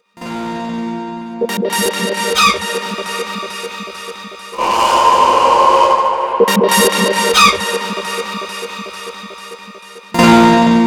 techno rise loop

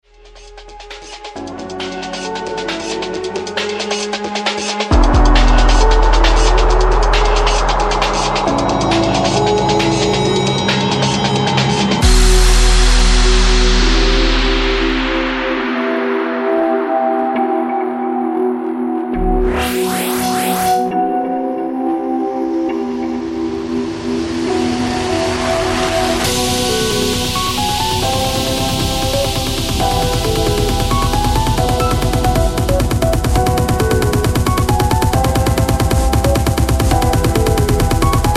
random drone

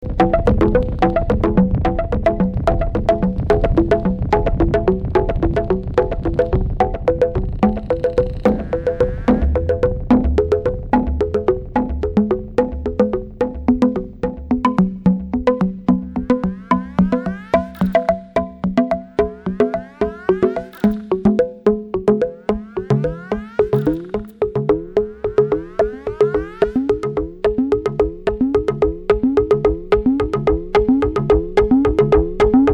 woodblocks

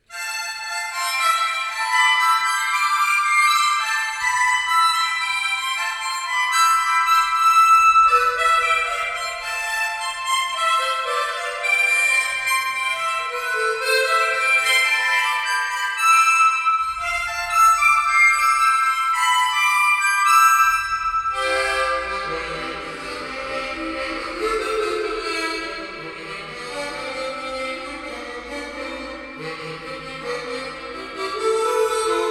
harmonica woman; scenes daily life rural south

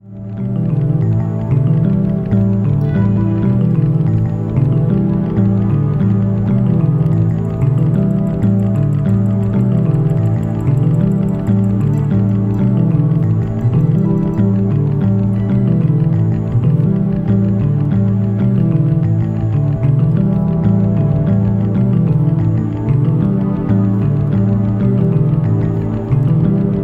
instrumental

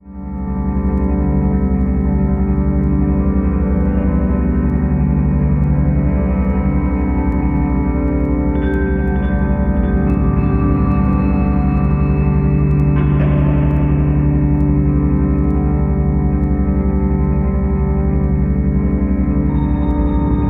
instrumental

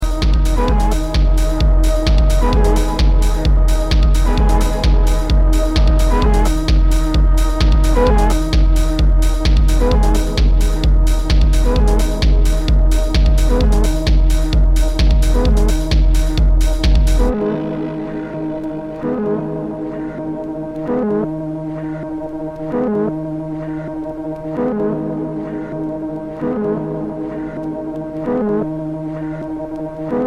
funk

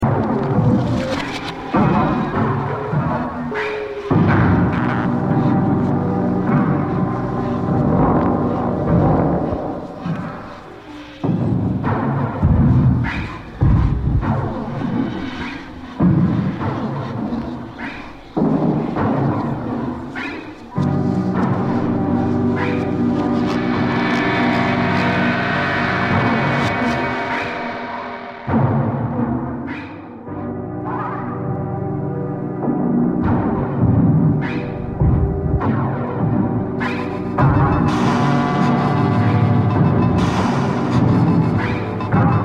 perc

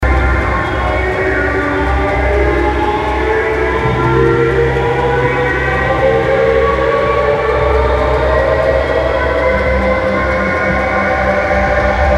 atmosphere tool

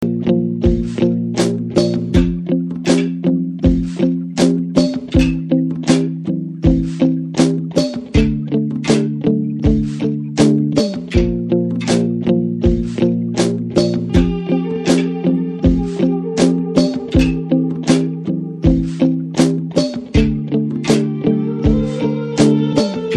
organica days funk chill remix

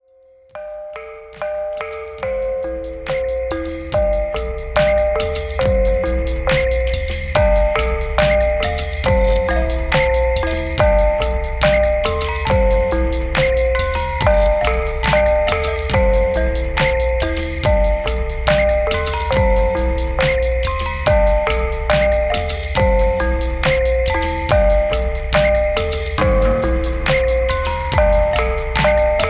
gamelan sketch

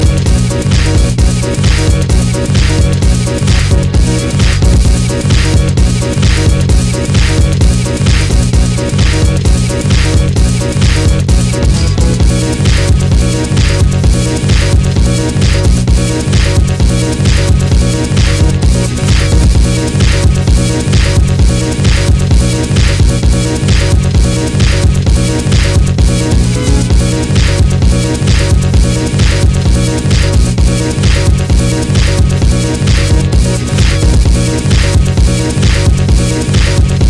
d funk

